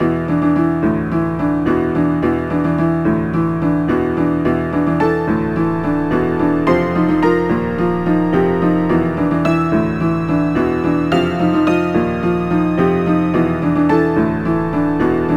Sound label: Dance Rumba Fast; Knigge Spielend zum guten Benehmen; NDS